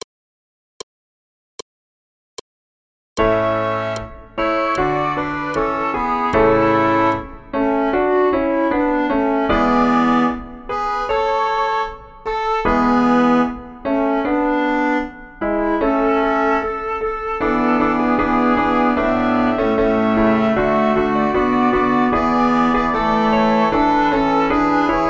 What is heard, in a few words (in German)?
Der Greis w Piano in A all; Haydn